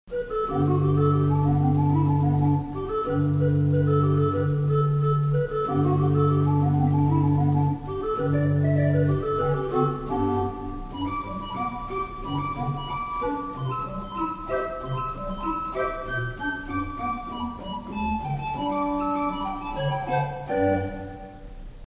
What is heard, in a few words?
Organ Great Bourdon